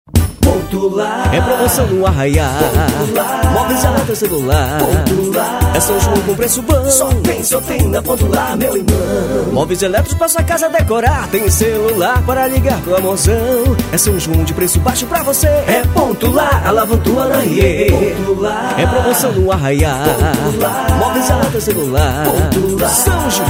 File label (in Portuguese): jingle ponto lar sao joao; impact sound studio